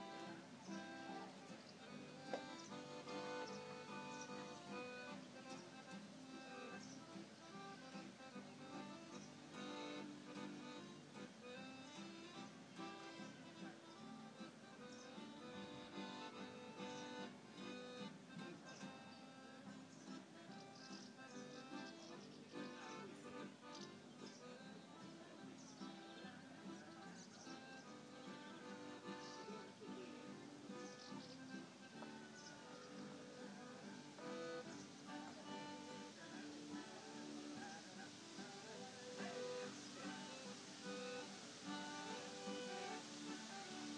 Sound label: accordeon music at the bee keepers gathering